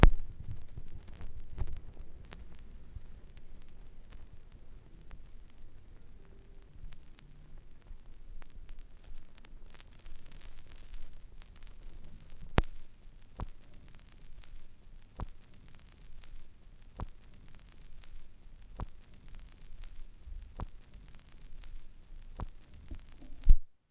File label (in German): schallplattengeraeusche